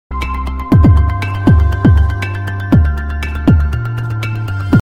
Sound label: bass, hip hop